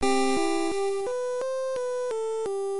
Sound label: lullaby; pokemon; P3D-Legacy; 2.5DHero; intro